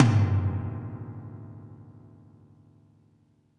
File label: Tom Hall Mid